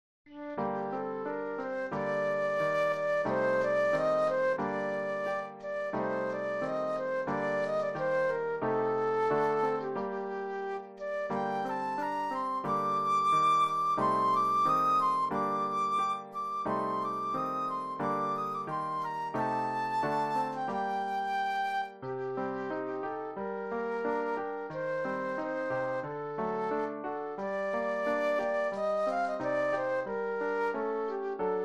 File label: Flute Solo; classical